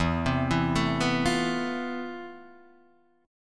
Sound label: F Major Sharp 11th E